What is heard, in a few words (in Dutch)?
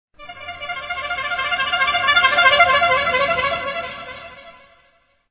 tour claxon